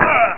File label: die; zombieii; zombies